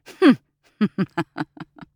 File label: Hunter Laugh; Realm Royale